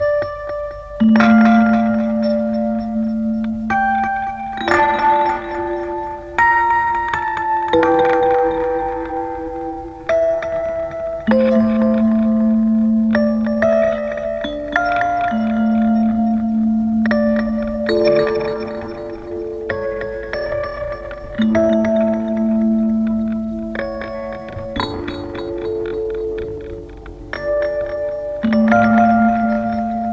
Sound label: sadmusic